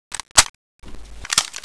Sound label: ruger 77 reload; soldat